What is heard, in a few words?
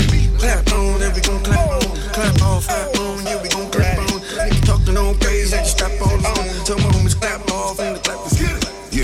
funk; multitrax